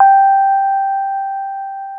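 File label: AMP FENDER G5, Keyboards Old School; USB Soundscan vol.09; AKAI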